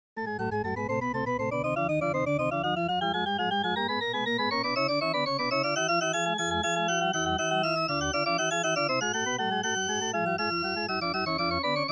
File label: Prelude 13ET Organ Pipe; Jeu des flûtes